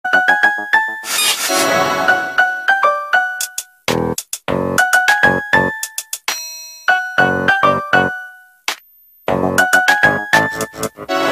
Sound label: Nokia RnB